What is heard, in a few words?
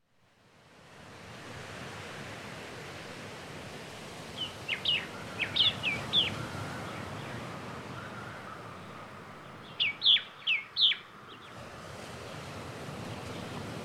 Tropical mockingbird